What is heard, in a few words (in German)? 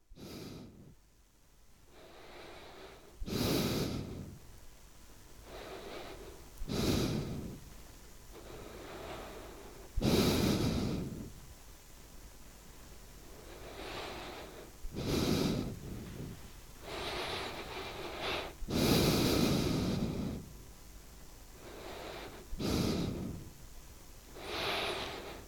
schall trichter klanginstallation breath; neues kunstforum koeln; klaus osterwald